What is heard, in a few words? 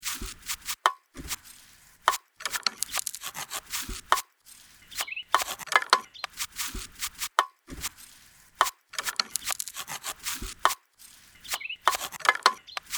nature core 147bpm